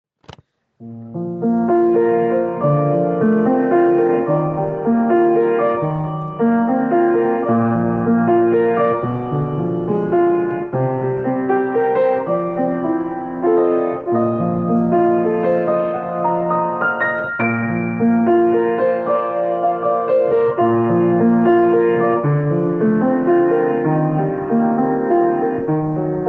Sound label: Bb Major Flittery Progression